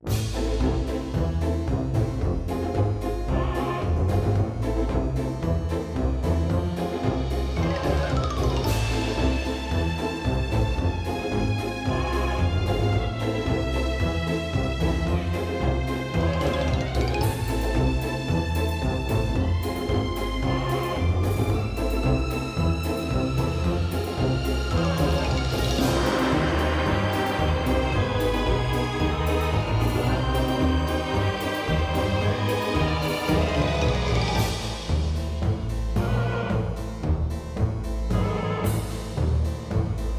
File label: bgm freespin